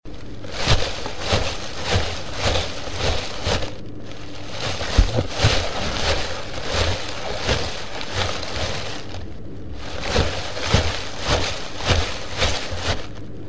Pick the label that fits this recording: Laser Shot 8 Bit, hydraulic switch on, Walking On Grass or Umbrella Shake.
Walking On Grass